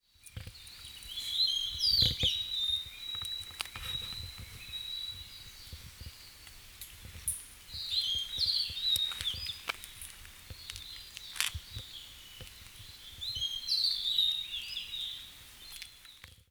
ooruri, bird song

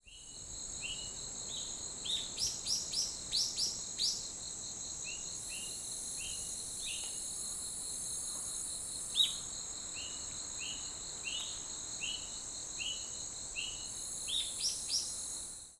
ryuukyuuhiyodori, bird song; リュウキュウヒヨドリ; さえずり; 沖縄県国頭郡国頭村

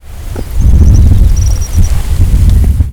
kayakuguri, bird song; カヤクグリ; イワヒバリ科; 山形県月山弥陀ヶ原; J-eco 鳥のさえずり図鑑

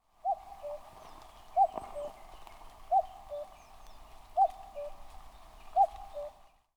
kakkou, bird song; カッコウ; 鳴き声; 長野県小諸市高峰

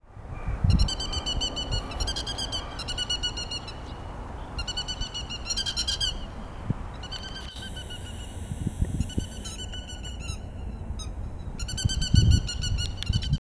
keri, bird song

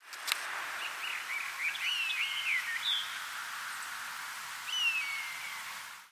nosuri, bird song

